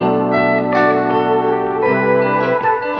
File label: horns, Loops, Phonky